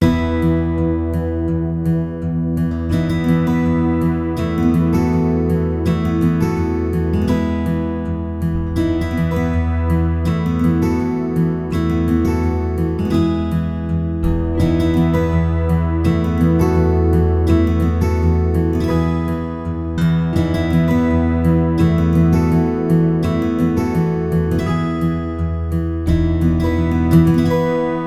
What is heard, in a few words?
Gleaming Guitars